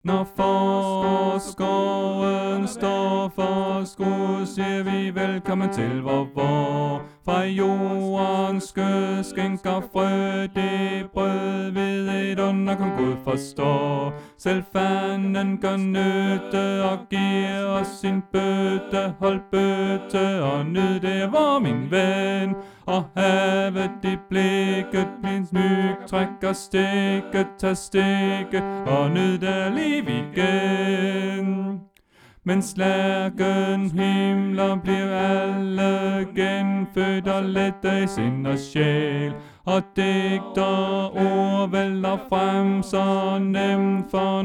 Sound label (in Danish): Bas